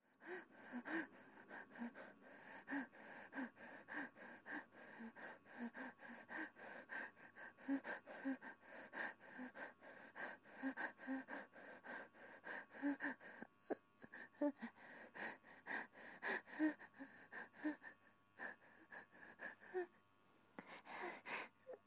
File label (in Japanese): オナ声